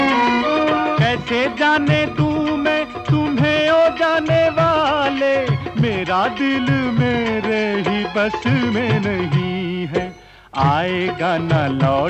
male

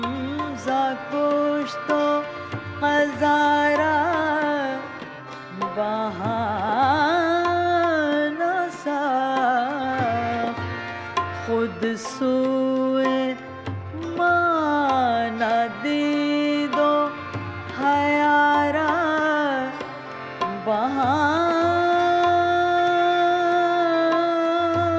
Persian Kalam

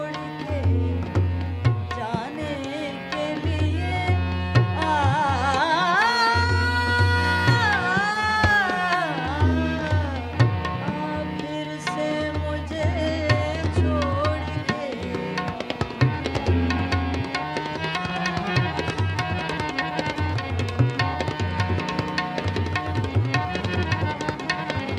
Kafi (Folk)

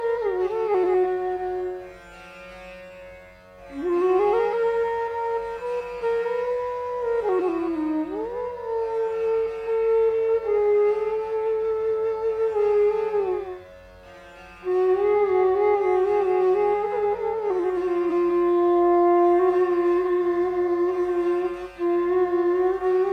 Raag Piloo - Flute

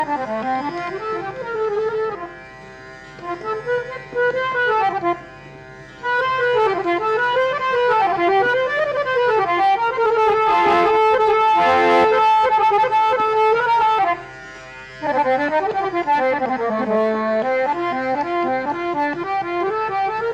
Thumri Pahari - Harmonium

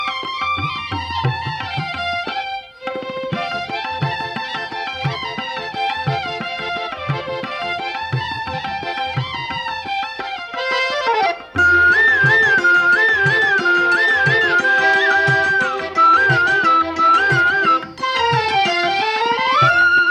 Instrumental

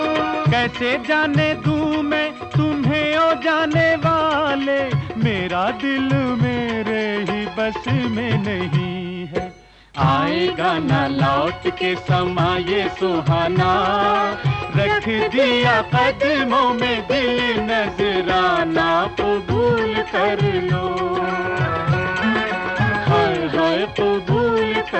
duet

